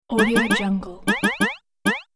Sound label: multi jump game player character action